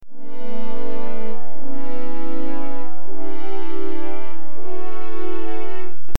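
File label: Loop French horns Dm 160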